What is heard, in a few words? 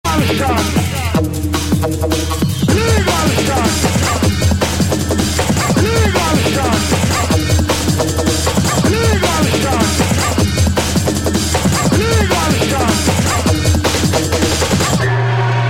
Drum And Bass